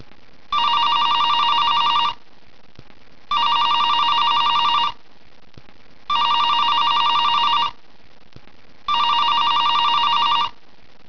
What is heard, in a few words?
PHONE; old phone ringing